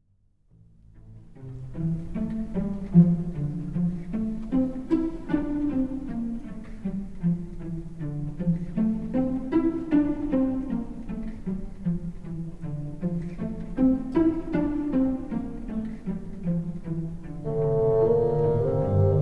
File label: The Orchestral Bassoon; Sibelius 2; Rattle; sibelius 2 mvt 2